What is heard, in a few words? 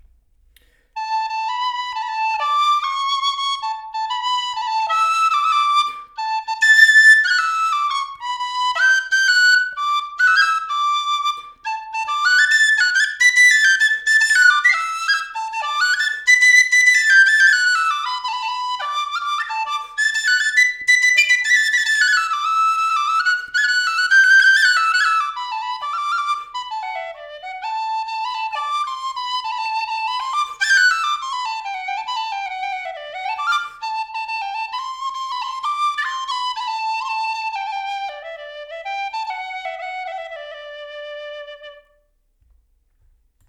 flute